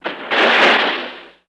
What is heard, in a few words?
Car Bottoms Out; skids autos